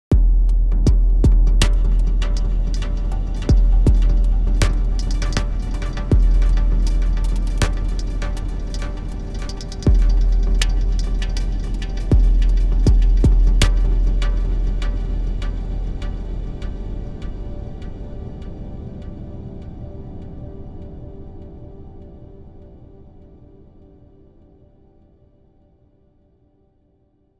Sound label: drums